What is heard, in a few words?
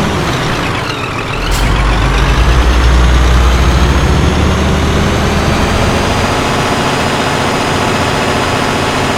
second, vehicles; rescue truck